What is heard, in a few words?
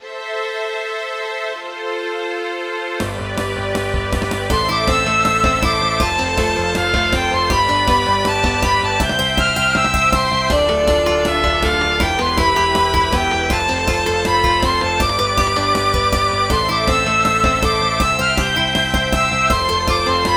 Battle; ハロウィン曲のつもりのなんちゃってオーケストラ曲。